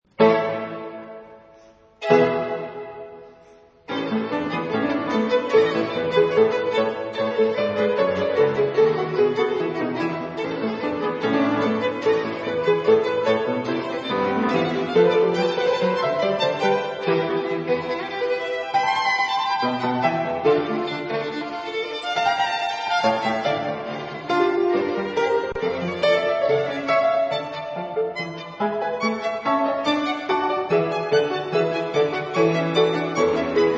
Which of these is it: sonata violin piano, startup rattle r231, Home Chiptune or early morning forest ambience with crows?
sonata violin piano